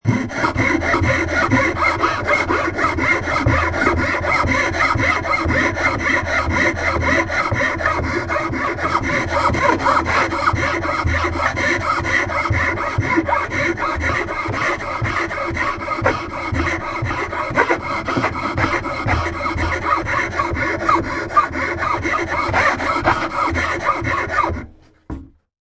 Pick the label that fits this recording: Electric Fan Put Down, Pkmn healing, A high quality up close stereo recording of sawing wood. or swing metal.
A high quality up close stereo recording of sawing wood.